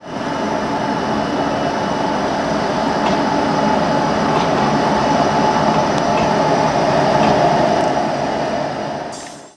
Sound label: class 71; Simutrans; Pak128.Britain-Ex